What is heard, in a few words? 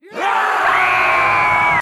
Cheer scream